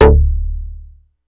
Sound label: Synth one shot Stab D, Bass; Slate Digital BASSHOLE Sample Pack